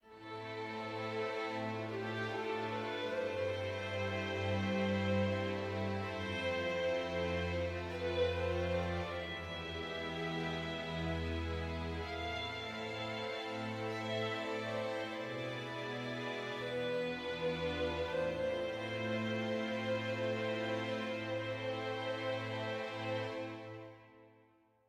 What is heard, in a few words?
sad med